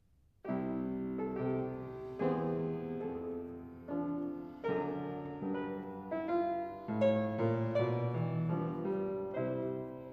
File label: Bagatelles for Solo Fortepiano; Eric Zivian; Beethoven